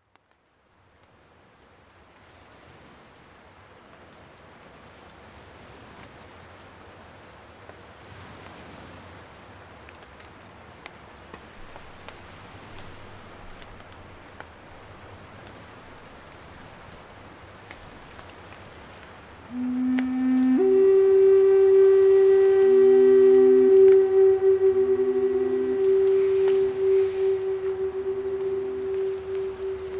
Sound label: Rain Forest Canyon Meditation